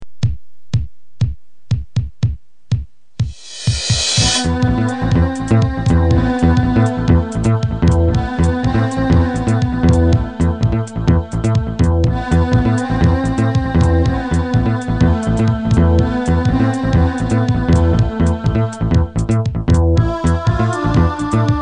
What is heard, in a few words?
groove